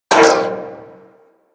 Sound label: Bullet Impact Debris Pipe